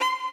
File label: solo